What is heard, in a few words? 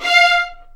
F5, VLNS ARCO; East West; The Ultimate String Collection